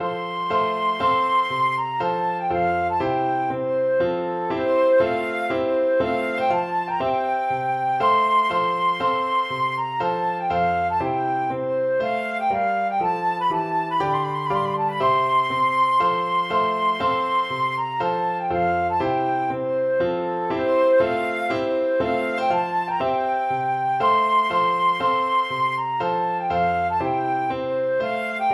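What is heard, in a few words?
trumpet tune, flute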